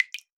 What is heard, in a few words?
Drip; Drips